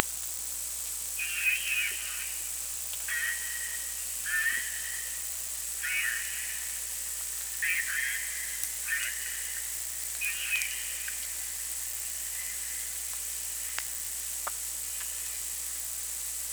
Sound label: SONAR